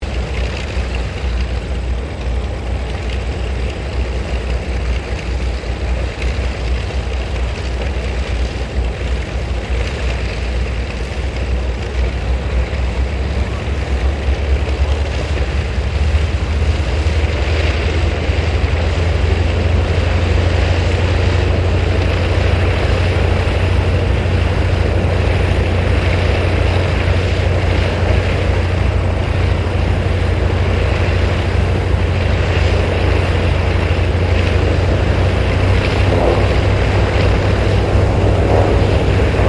unknown propellar squadran idle, Airplane; Various unidentified aircraft

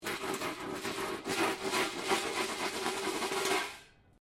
Metal rubbish bin lid spin concrete

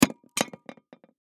Dinner Plate Drop, Plate Drop Wobble; Household; Dishware; Blastwave

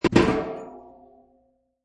Keg Bang; Bee keg bangs; Kegs; Bars

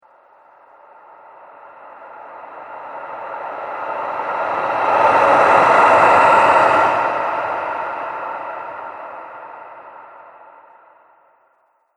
Ghost Of The Cosmos; horror; Ghost Sound Effects

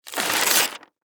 Office Package Label Peel Back Off Sticker; Paper; Offices

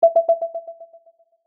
Sonar Ping Space, Science, Fiction; Communication Sound Effects

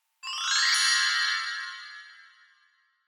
Bells Chinese Bell Tree; Bell Sound Effects; Bells (Sound Effects:Musical)

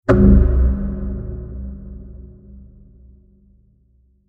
Long Low Sci-Fi Impact; Low Impacts (Sound Effects:Impacts)

